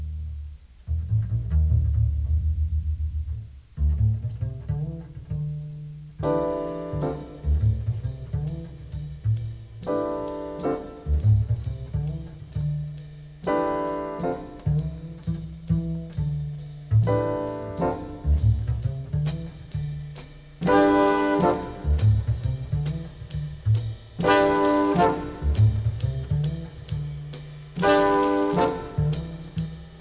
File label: jazz